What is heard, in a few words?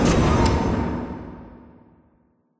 bridge stop